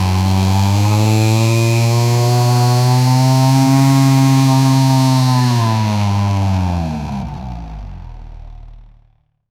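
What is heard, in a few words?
v8 american full size pickup, trucks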